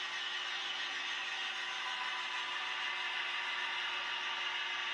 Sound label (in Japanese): kinzoku